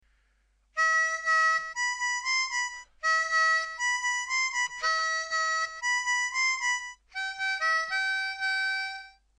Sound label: Harmonica